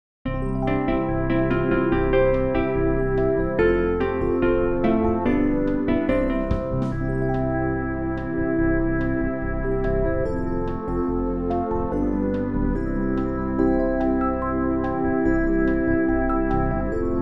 God Is Our Refuge backing; New Hope Music

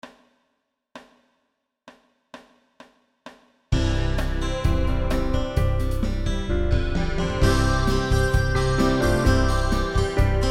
backing; New Hope Music

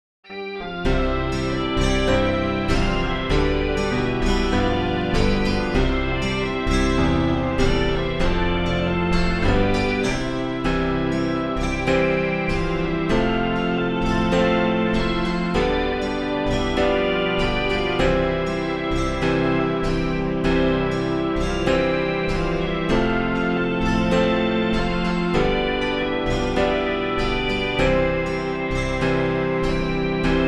backing; New Hope Music

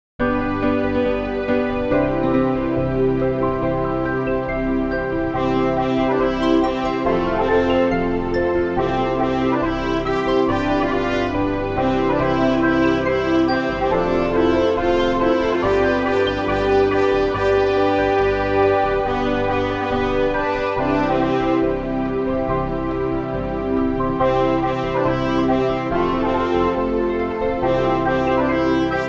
instrumental; New Hope Music

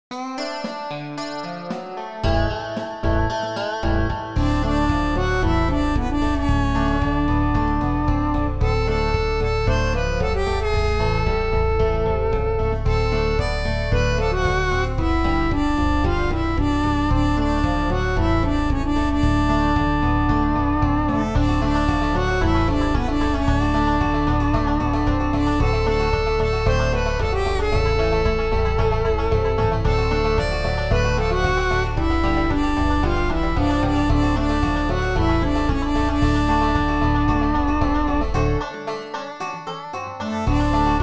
country instrumental; New Hope Music

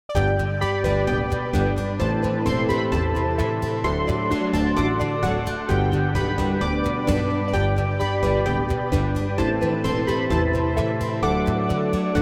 backing; New Hope Music

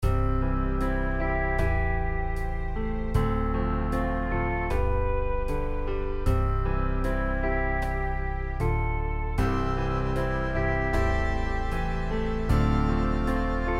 backing; New Hope Music

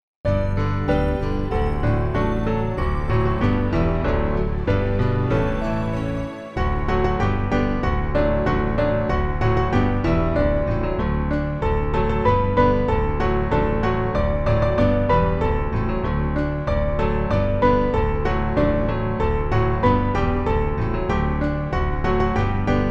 backing; New Hope Music

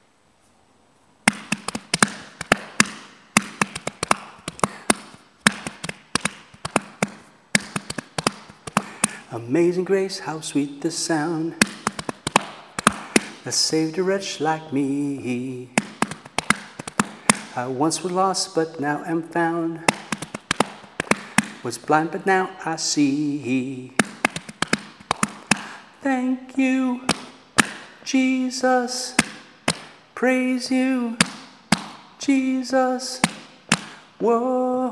hymn chant

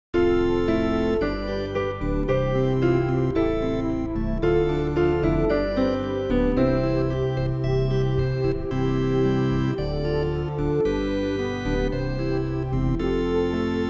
backing; New Hope Music

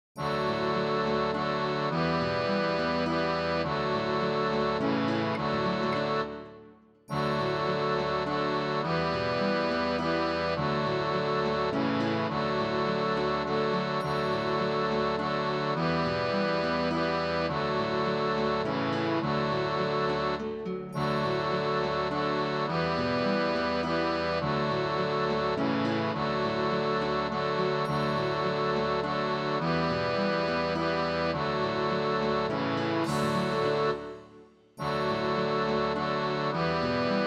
In Bethlehem backing; New Hope Music

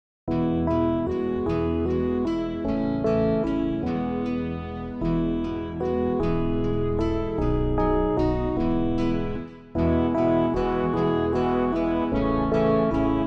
instrumental; New Hope Music

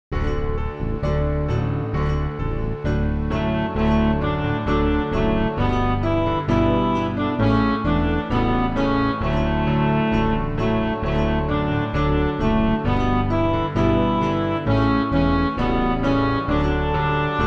instrumental; New Hope Music

